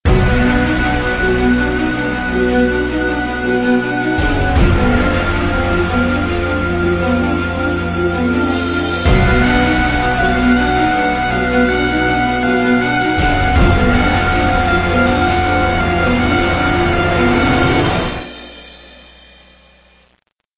ready darkness; cstrike; zombie escape

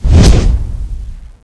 attack; Frozen Terror

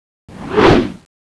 shoot; thanatos9; cstrike; weapons

